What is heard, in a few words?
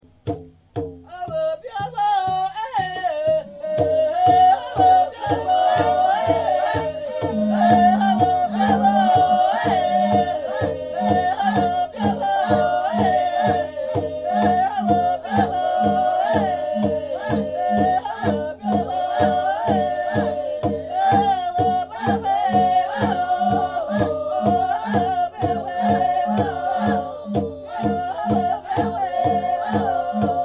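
Healing Song, Abau Upper Sepik New Guinea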